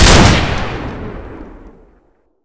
rifle fire; deluge; weapons v3

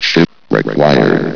ship call

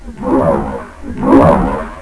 pit drone idle; svencoop; sectore

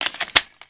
thompson reload clip in; svencoop; ww2projekt

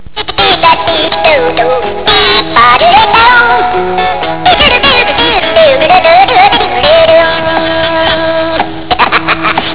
dedodedo loop

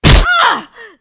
headshot female

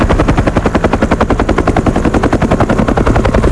heli; cs olympe

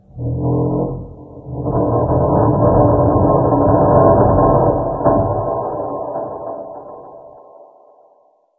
script metal; secret garden

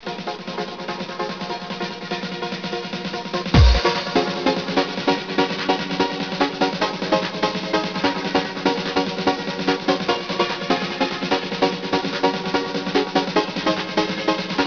music; db lego borg

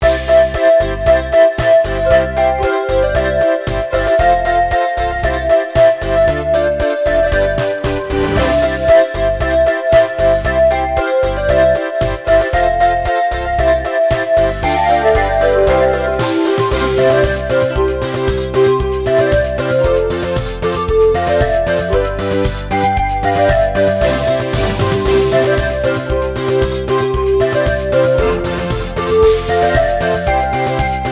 newyear music

